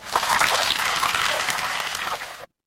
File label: water stream